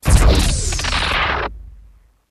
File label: Starship 1 Overhear